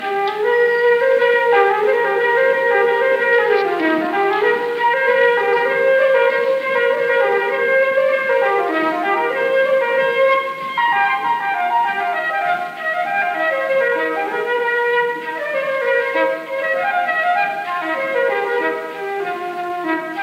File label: flute; Planxty Davis